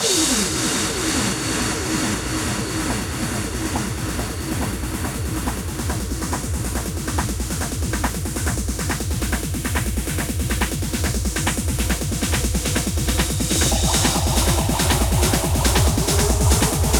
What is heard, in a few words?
140BPM 16Bars, HandsUp & Trance Fills; Transformer; Vengeance Samples